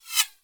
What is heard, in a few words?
bullet pass; teardown; ps5 windows xbox series xs